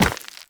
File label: Operator Run Rock, Footsteps; Warframe